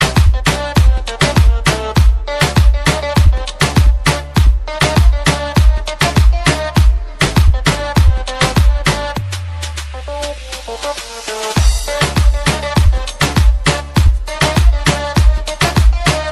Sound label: arabic remix ringtone